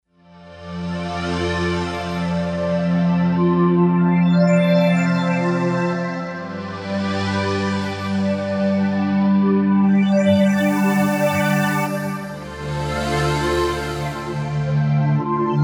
Tranz Pad, Kawai K5000S

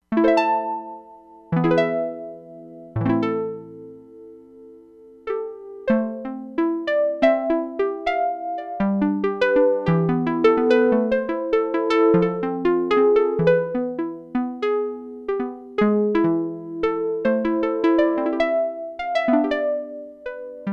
LUTE, Crumar Bit 99; Factory Programs

